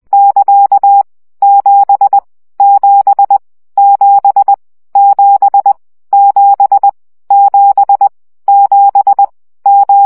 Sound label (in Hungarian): CW gyakorlas 1 17 baud